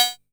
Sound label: Cow, Korg KR-55B, Drum Machines; 300 Drum Machines